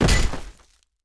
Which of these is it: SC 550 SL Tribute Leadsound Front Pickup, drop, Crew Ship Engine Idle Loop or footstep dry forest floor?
drop